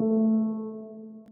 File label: bg epiano dark a3; gamerip; lofi room demo